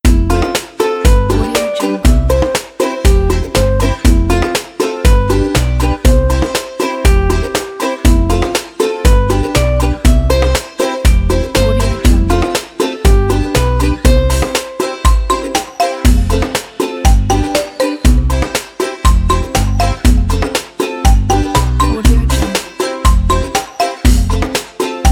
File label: breeze